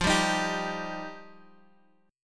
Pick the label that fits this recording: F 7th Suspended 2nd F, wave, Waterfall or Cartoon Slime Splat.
F 7th Suspended 2nd F